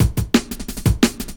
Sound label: 175 D&B Drums; Drum n Bass; Pro Tools Essential Loops; Digidesign; Dance DJ